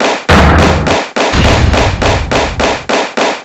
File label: heavy clap